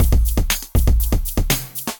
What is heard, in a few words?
Acoustified Kit, Drums